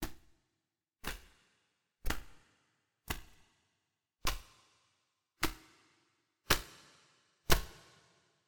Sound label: hitahita